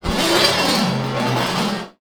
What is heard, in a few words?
Cymbal; musicradar; cartoon caper